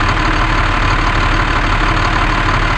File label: Motor, Train; SD-9 Teher